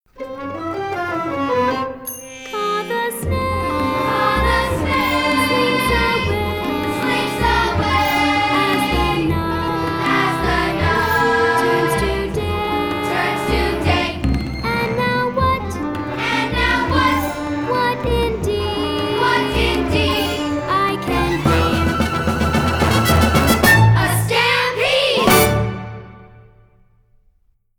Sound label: vocals